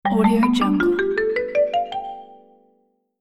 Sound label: cartoon ladder climb up